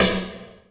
Oneshot; Sonic Foundry (Sony Creative Software); Esoterik Beatz; Quesy

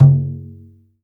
GENDANG F3, GENDANG DRUM; Spectrasonics; Heart of Asia

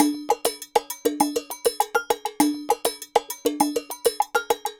Ensemble, Latin Percussion

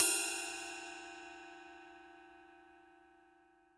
RIDE, Drum Dry; East Collexion; Drum 1 Dry

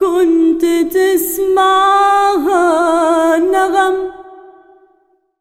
SINGER, ArabianTraditions

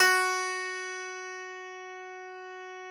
F, Harpsichord; Total Piano

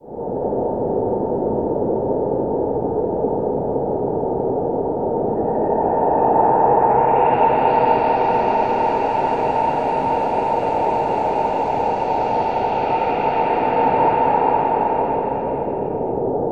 AMBIENT DRONE; Zero G; Malice In Wonderland

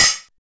909 OH; BONUSHATS

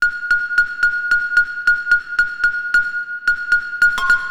Xylophone; Sonic Foundry; Stylus Pressure Urban Grooves on Digital Wax; Honk Diggity

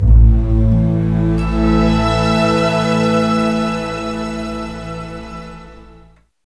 CLOCK; East West; Scoring Tools; ORC; Clock Time

